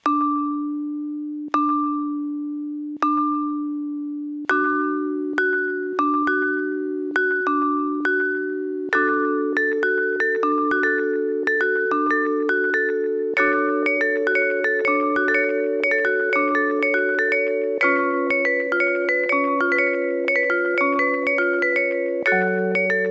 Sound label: flower polyrhythms